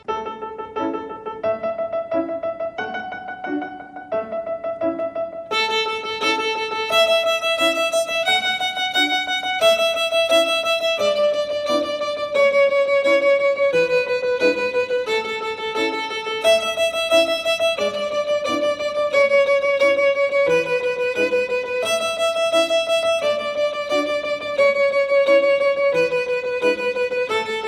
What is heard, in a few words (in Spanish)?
Twinkle Ritmo E Normal